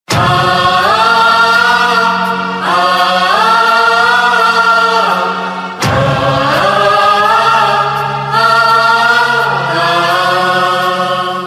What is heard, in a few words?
Title Humming Tune; Sanjivani - A Medical Boon